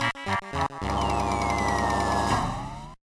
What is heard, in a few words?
Lose; MPA; Bowser Land